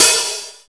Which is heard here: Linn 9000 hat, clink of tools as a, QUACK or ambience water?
Linn 9000 hat